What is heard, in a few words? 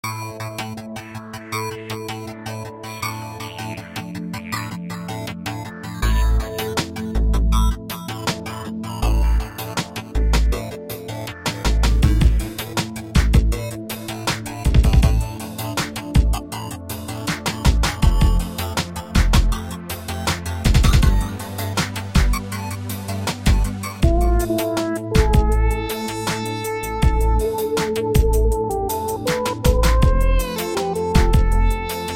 synth power